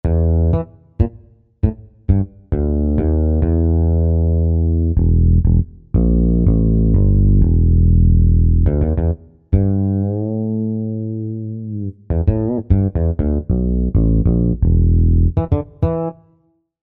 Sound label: Stein Bass